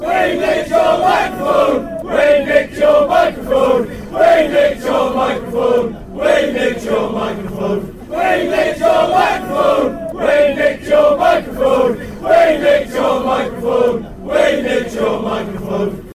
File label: chant; we nicked your microphone